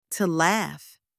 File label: to laugh